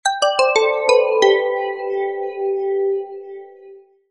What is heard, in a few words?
Bells